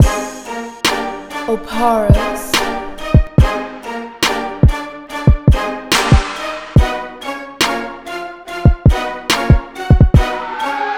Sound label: 71 BPM